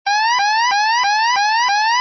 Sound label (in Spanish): alarma bateria